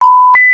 warning signal; MioMap C220; Navitel

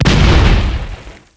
explosion; Rabbit-Wars

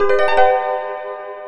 item get; OneShot Fading Memory

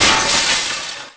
light destroyed

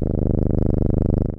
cat purr; OneShot Fading Memory

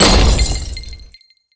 goldpot break

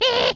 piano g

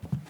step carpet; OneShot Fading Memory

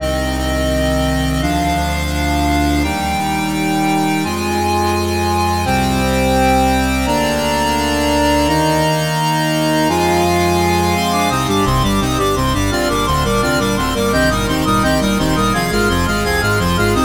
170BPM Organ

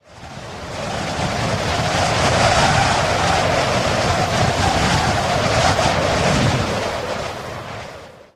high altitude; OneShot Fading Memory

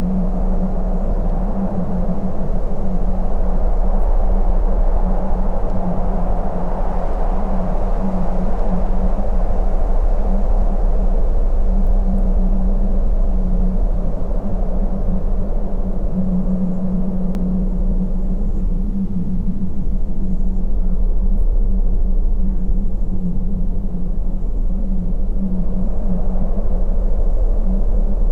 Mines Ambience Wind; OneShot Fading Memory

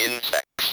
insects

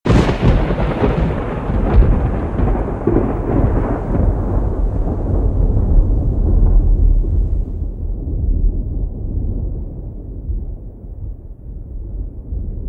thunder

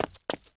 footsteps; Rabbit-Wars

